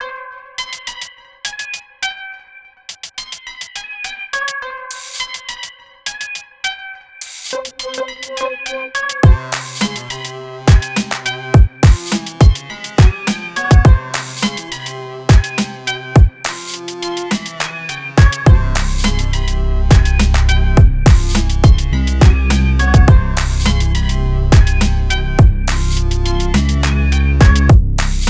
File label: 104 Cmin, Hip Hop Exotica; Big Fish Audio